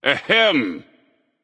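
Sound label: nonverbal clear throat; smite; voicepack; merlin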